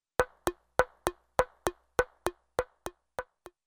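Rapid Back & Forth Clock Ticks with hollow Bongo-like; Cartoon; Hollywood Edge; Cartoon Trax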